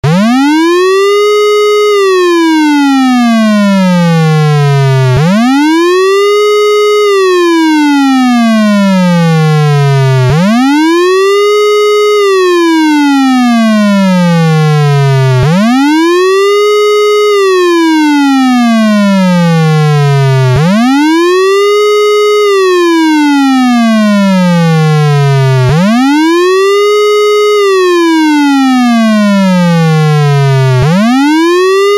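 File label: Siren Tone WAIL (RUMBLER); UNITROL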